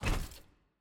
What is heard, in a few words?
ui room select room locked